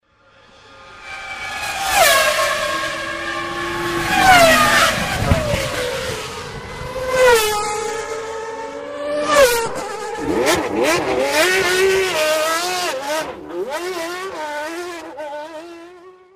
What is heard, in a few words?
formula one